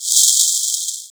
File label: Shake; Shaker; Drums; One Shots; Deep Fried